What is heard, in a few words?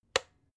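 electric whisk turn on single, kitchen; haus-garten; kueche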